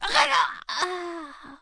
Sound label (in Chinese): 女死亡声音